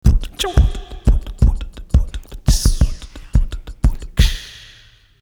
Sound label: Beatbox; AMG